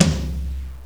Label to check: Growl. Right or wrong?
Wrong